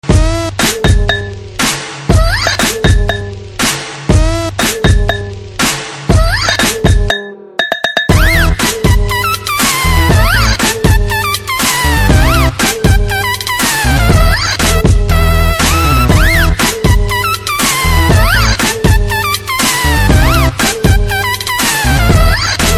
Telugu BGM Ringtone; Liger

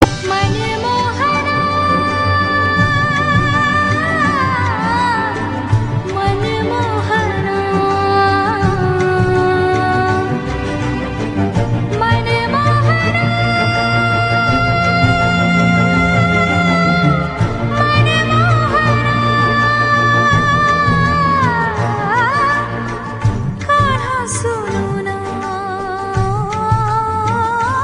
Bhajan Krishna Ringtone

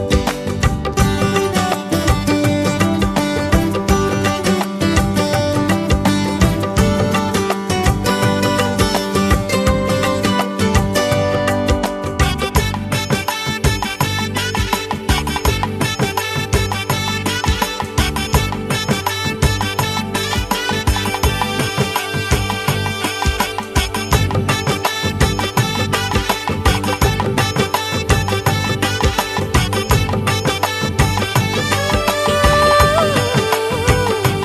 Instrumental

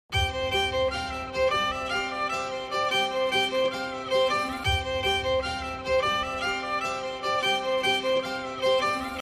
Trailer BGM Ringtone; Madhavan; Maara

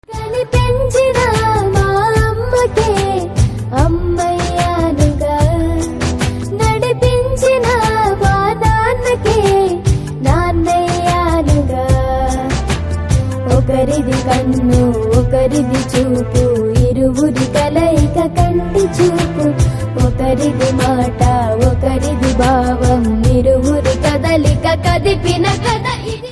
Ringtone

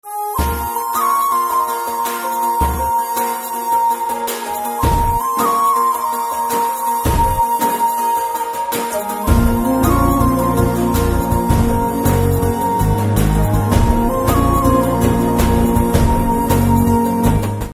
Flute BGM

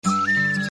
Koel Bird

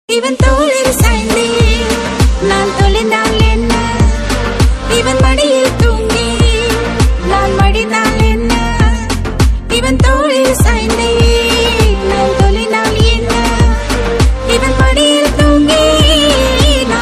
Action, Tamil

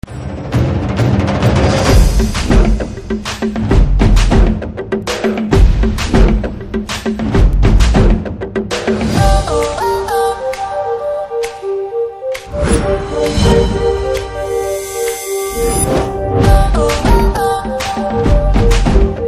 Drum Beats

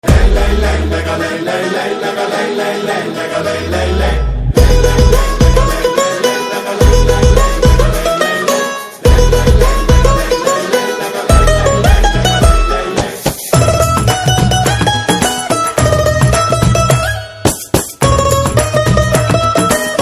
BGM Banjo Ringtone; Pushpa

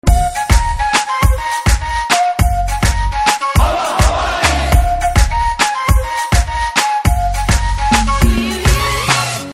BG Tone

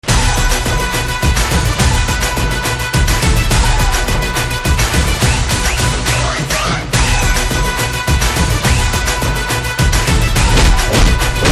Drum Beats

